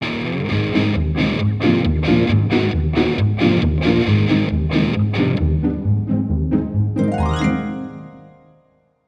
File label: Bari Sax